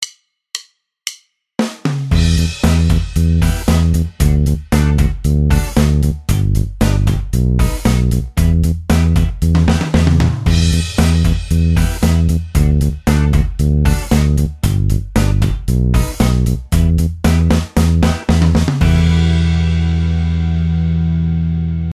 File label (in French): notes piquees exercice, basse; exercices